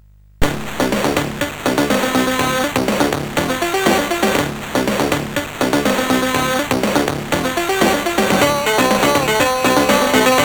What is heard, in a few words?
Music, sid, CSG8580R5; Lamiga